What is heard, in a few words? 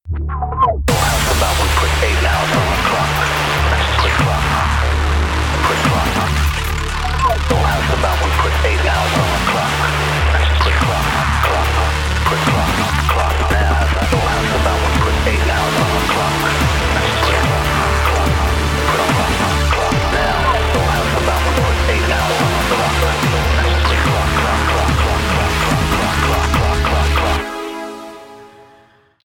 typing; tf2ware ultimate